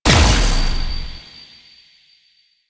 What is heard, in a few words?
Fusion Pillar Pulse; Warframe; Qorvex